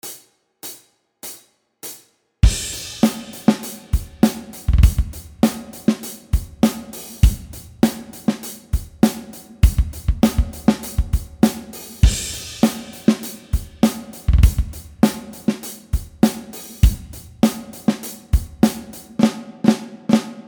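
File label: Drum rhythm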